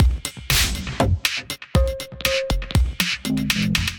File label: Kit, Drums; Big Tune Fish Kit; Ableton; Skitter and Step